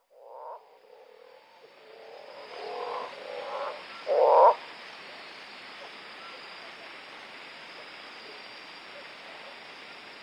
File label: Osteocephalus taurinus